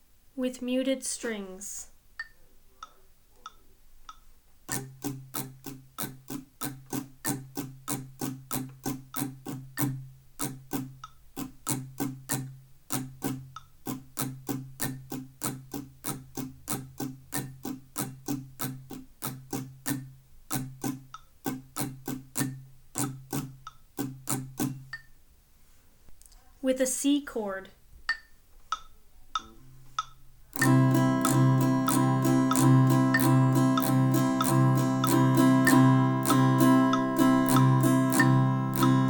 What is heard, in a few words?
strumming pattern